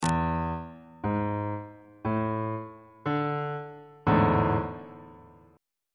g double sharp major 7th suspended 4th 2nd inversion on bass clef